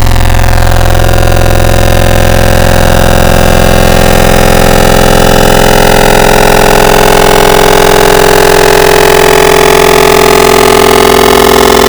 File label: Metal Fish, FM Wavetables; Cymatics; Virus Wavetables; Serum